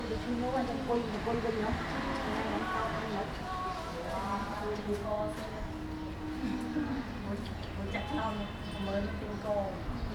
Voice buzz cafe, phnom penh; 2019; Cambodia phnom penh

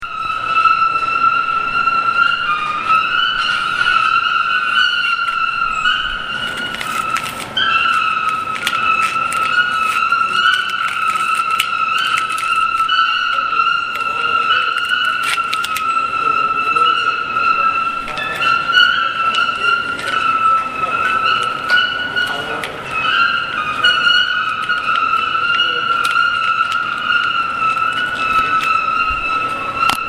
metalic dog squeeks

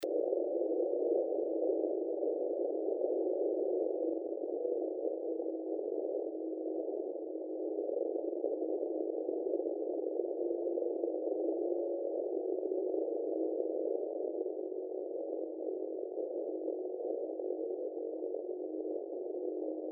electric gamelan STATIC CICADA

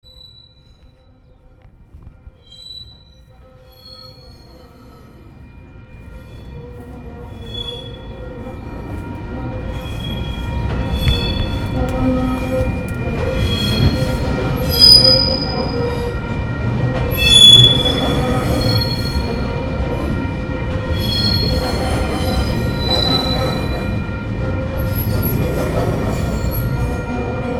train port chalmers

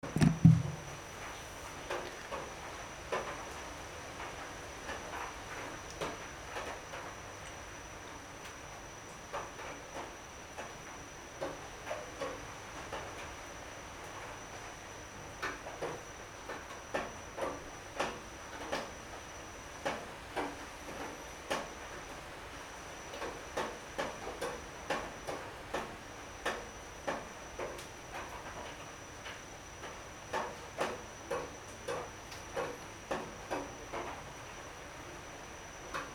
rain drips; filmscore

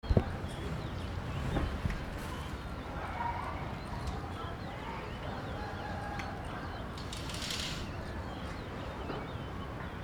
streets ambient noises, phnom penh; 2019; Cambodia phnom penh

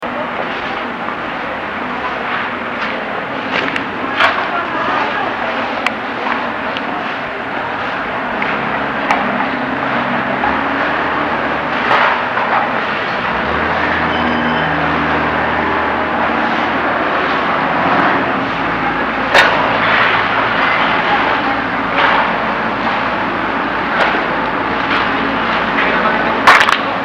shrine dictorphone mexico, street recording

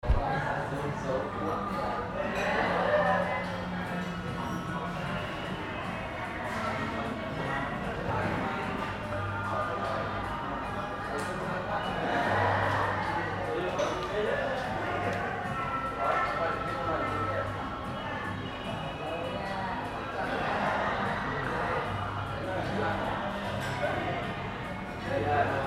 ambience noisy cafe, phnom penh; 2019; Cambodia phnom penh

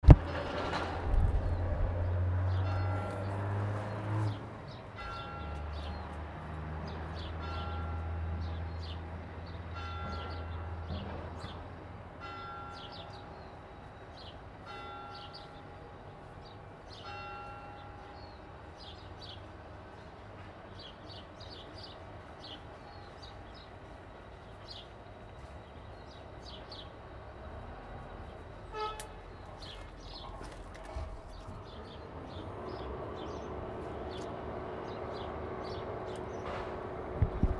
daily ambience bells and birds; Port Chalmers

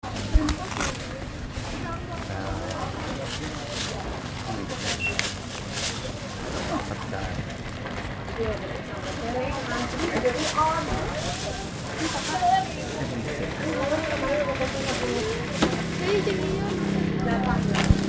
shop, Indonesia street recordings

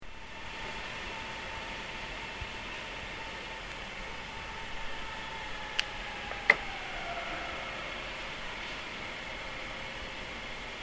train praha

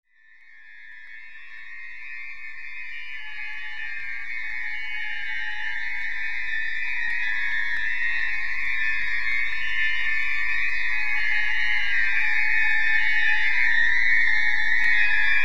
High pitch drone

